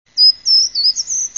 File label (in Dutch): boomkruiper